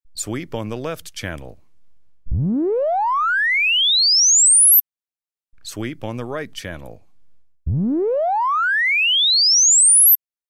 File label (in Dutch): Kanaalindicatie met sweep, 20 Hz tot 20 kHz, -10 dB